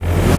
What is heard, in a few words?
p atk euphnium